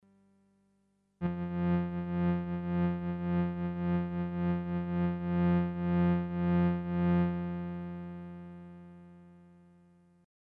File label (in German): Modulation Auf Amplitude; virusc